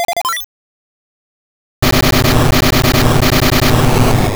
Present Bomb

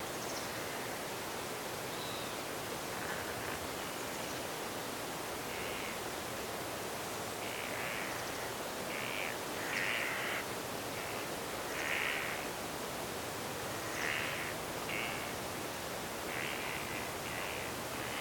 Pound

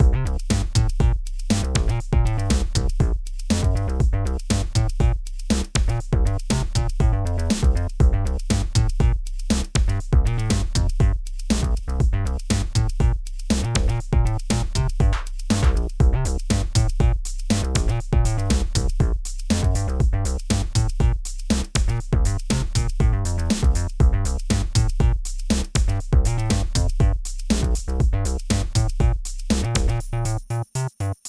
BGM rhythm; game builder garage 2021 switch; Node 07

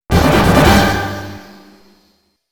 Insert Coin

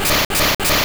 Fury Attack

